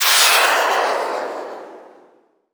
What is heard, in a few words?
missile; 1001 Sound Effects